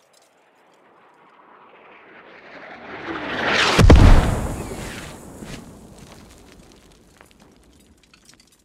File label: mortar impact 50m; gmod; better mortar